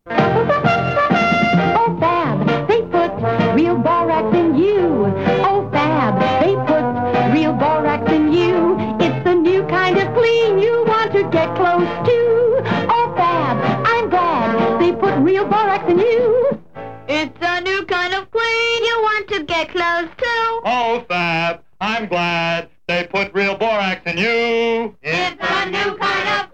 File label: Jingle